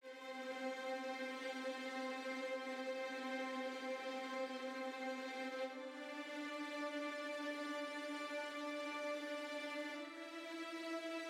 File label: Vinyl Strings F bpm 85